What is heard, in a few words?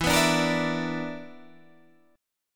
B Minor Major 7th Double Flat 5th, chord